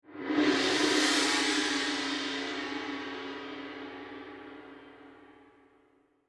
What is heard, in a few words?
gong